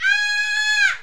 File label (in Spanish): Grito